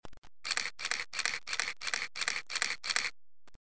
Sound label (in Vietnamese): Tieng man trap may anh lien tuc